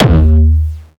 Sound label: Jump kick, Jump hardstyle kick